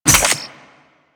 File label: Survival Pickup